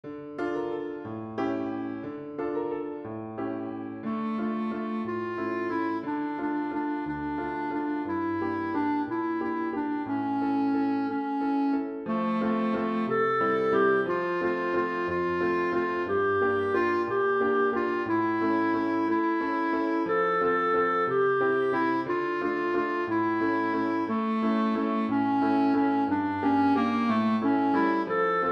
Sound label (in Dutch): Halleluya met klarinet